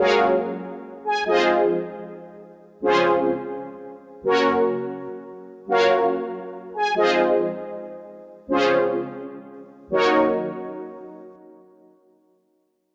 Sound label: Juno synth; WA Glow